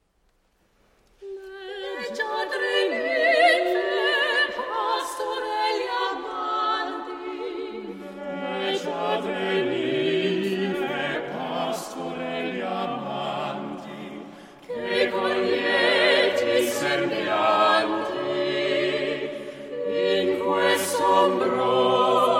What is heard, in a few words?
a cappella band